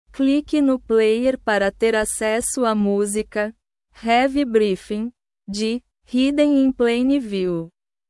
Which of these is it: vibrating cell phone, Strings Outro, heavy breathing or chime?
heavy breathing